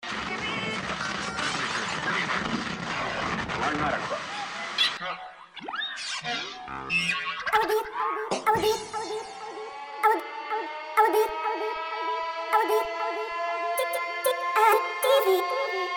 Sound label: trap beats